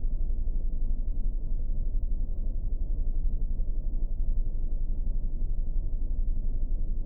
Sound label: room, drums; virtual drum sounds; custom drums